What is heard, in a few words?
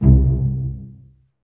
STR SEC, STRINGS; Roland - LA Composer vol. 1